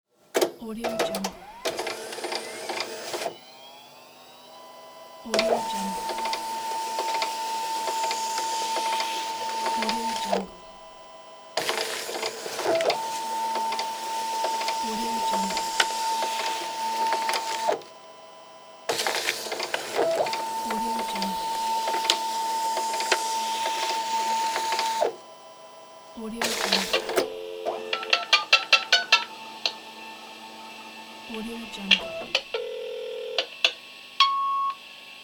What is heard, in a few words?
fax machine running dialling; wistansound